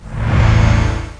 scan; Connections

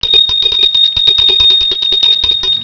2 BELL; Atomic Clock 6

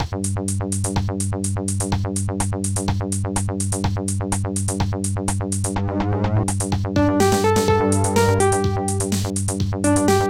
piano song

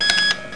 computer

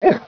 beg, dogz

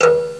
xylophone, percussion instruments; Sound Effects for Multimedia - Volume 1

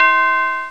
Tubular Bells (C4); Patches; X-Wing

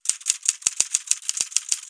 go trade; Imperialism

